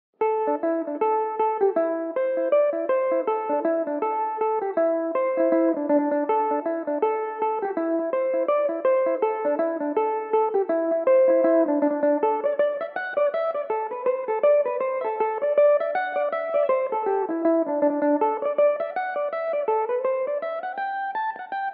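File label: D mixolydian, irish mandolin; repeal of the union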